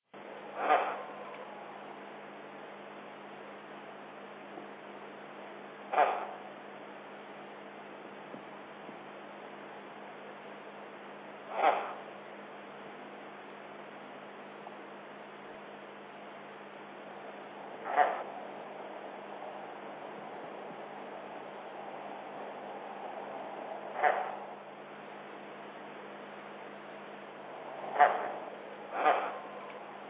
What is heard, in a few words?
squirrel glider